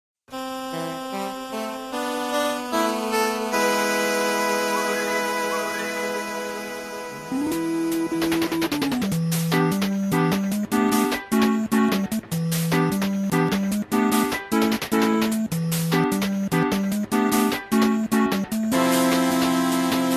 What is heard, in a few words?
8-Bit Theme; Steam Gardens; Super Mario Odyssey